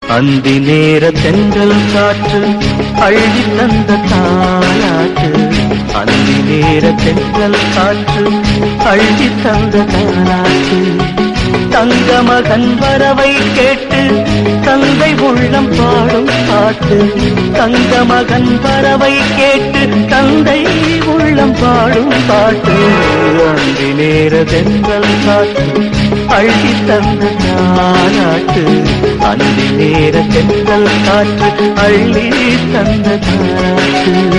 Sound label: tamil bgm